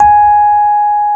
G#4, Electric Pianos; Wizoo

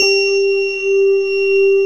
SYNTH CARILN

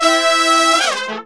BRASS SAMBA

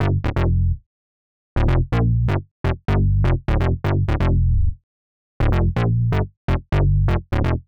Synth Bass A, 125; PowerFX - Nu Jazz House

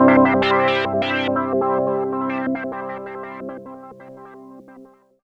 Bubble Synth OS; Sonic Foundry (Sony Creative Software); Rondo Brothers Trip-Hop Loops & Samples

